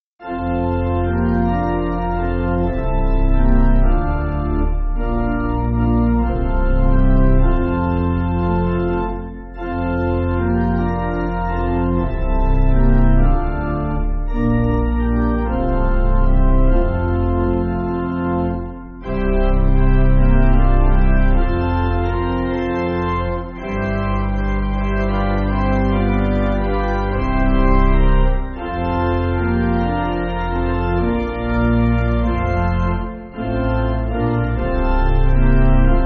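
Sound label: Pipe, Pipe Organ, Music Backing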